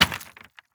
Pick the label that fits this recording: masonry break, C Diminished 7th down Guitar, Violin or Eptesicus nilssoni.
masonry break